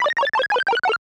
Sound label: feed fail